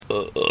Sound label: burp; burp small